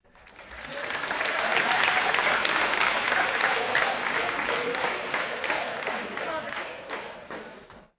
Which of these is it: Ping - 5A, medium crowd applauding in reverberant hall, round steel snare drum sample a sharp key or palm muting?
medium crowd applauding in reverberant hall